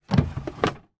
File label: household wooden drawer open empty